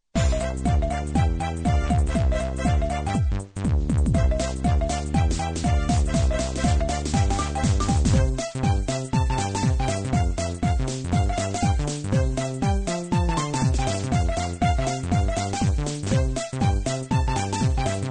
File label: Title Theme; G&WC